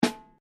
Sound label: snaredrum mezzoforte